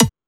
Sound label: Techno, EMU XL-7